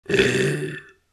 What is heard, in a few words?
Zombie idle grunt soft